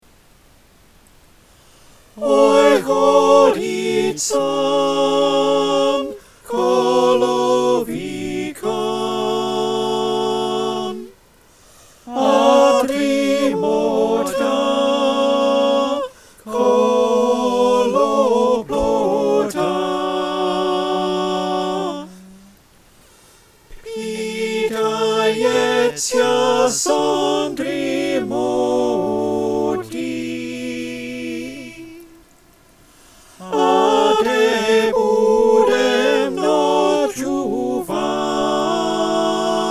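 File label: soprano alto tenor bass, choir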